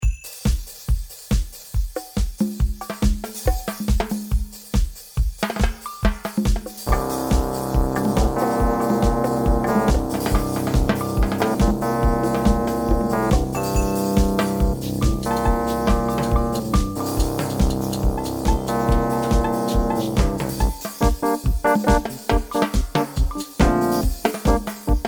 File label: Disco Type Beat